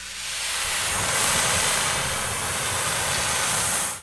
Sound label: NOIS; XXL 800 Production Effects